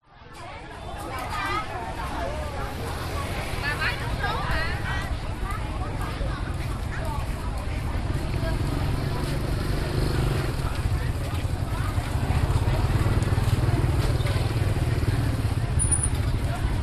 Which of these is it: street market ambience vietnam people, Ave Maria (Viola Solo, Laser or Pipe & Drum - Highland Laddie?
street market ambience vietnam people